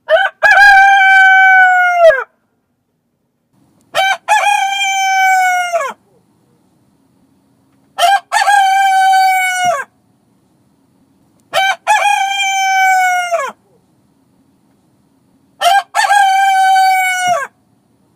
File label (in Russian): Krik Petuha